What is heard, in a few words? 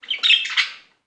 fowl; Immortal